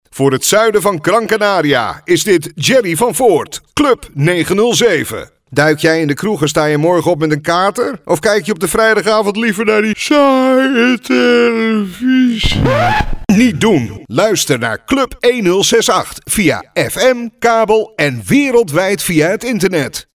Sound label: jingles